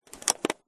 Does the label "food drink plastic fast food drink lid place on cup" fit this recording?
Yes